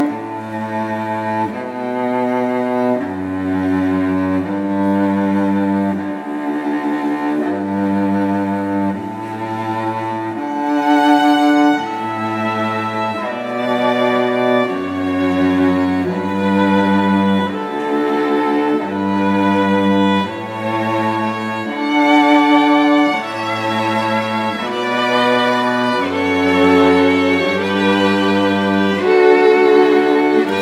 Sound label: Canon